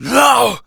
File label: Attack, Zombies; zps; Z Carrier Speech